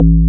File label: DX Bass A0; Proteus 2000; Multisamples; Digital Sound Factory Proteus 2000